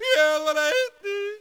Jodler

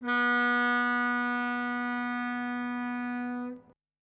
Schiffshorn

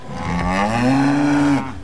Kuh

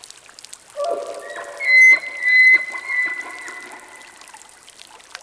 Elch

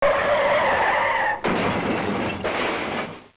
Auto Crash

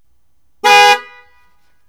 Auto hupt

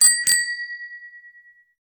Fahrradklingel

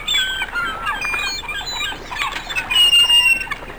Seevoegel

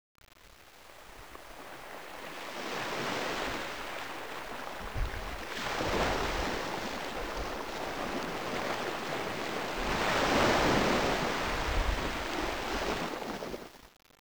Meeresrauschen

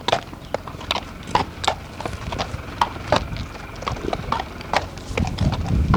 Pferdehufe

